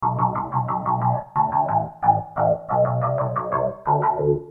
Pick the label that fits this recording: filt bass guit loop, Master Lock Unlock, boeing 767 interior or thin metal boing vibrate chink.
filt bass guit loop